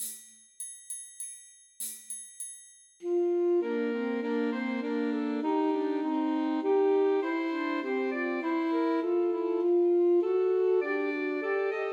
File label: Trios for Three Alto Saxophones; We Wish You a Merry Christmas